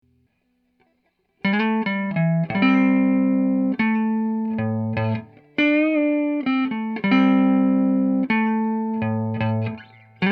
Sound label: Deluxe Reverb with Fat Cat with Blue + Alltone